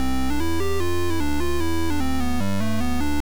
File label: Game Start; Jump Coaster; msx